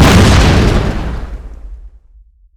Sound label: q attack; LoL; jinx base